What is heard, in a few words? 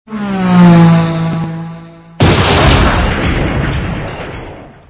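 Car Crash; vehicles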